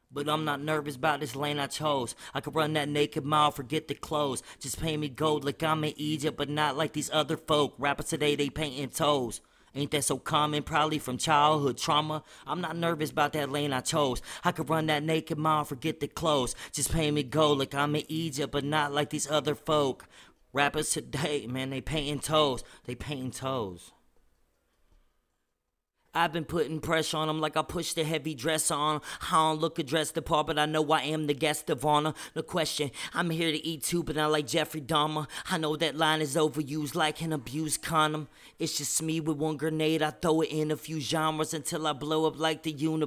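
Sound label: hip hop 157 E flat minor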